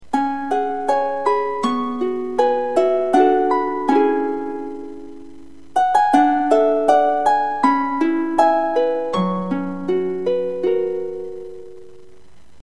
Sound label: gameover